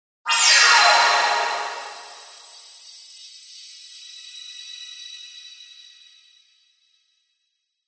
Meteor Twinkle Fly By Swell Synth, Sci Fi Magnetic Whoosh Slow Pass By Energy Flutter